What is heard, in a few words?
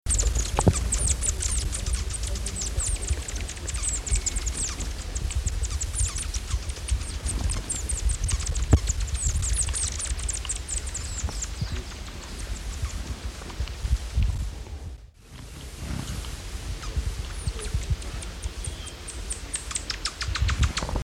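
buff-winged starfrontlet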